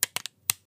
Random clicks; Foley; SFX; 7rystan's 600+ FREE EDM SAMPLES & DRUMS

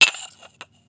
Cup clink, Foley; SFX; 7rystan's 600+ FREE EDM SAMPLES & DRUMS

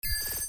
glitch; base pack

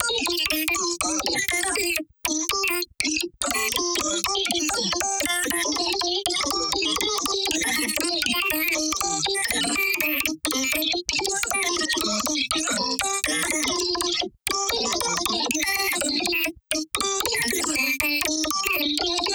vocal loop Eb 120 bpm; idioto; base pack

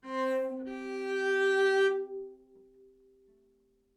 live string phrases, string phrase Cmaj 100bpm

